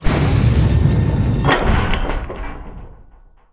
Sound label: 3 Part Door